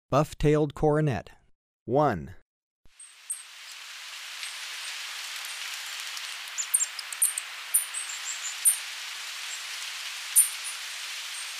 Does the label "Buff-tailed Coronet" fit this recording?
Yes